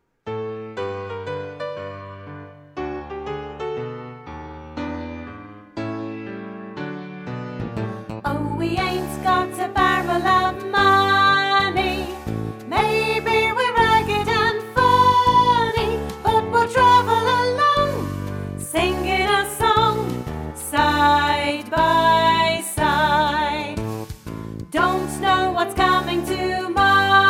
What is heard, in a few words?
Guide Vocal Track Verse